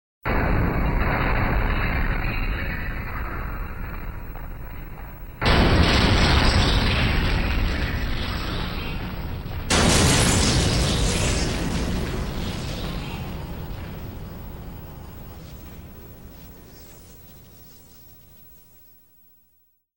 synthesizer; SOUND EFFECTS1

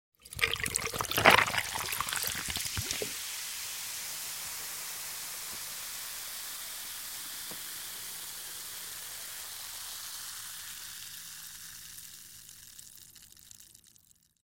Soda Pouring Into Glass w Ice & Fizz, Household

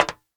metal, footsteps; 1000fx

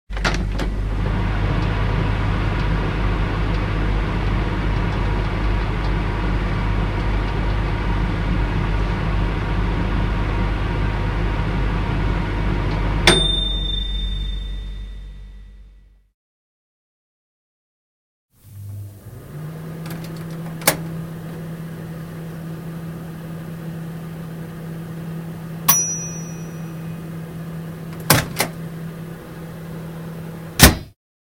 Microwave; 100 sound effects

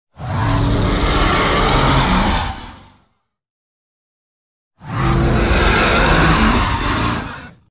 Animal stinger - Dragon fireball roar; Hollywood Edge Sound Designers Tool Kit

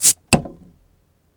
bottle pop, kitchen; 1000fx

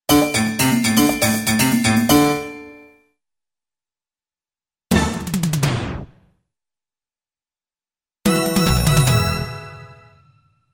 Short Up Tempo Music Track (keyboard Bongos Drum); Hollywood Edge Cartoon Trax

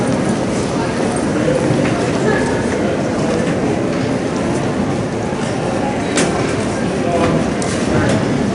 AIRPORT IN; 1000fx

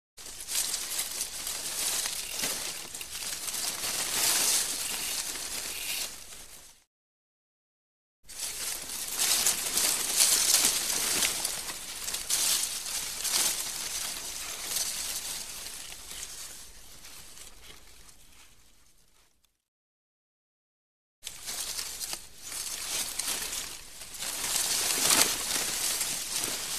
Leaves Heavy Rustle; Hollywood Edge Foley Sound Library

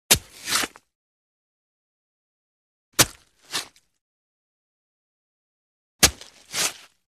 Stabs & Pull Outs Big Gritty Stab & Fast Pull Out; Hollywood Edge Foley Sound Library

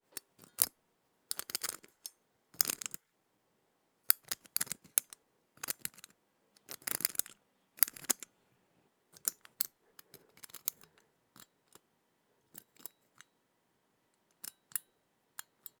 Lock Tumbler Rotate Clicky Mechanical Movement; Foley - Props; Foley Essentials

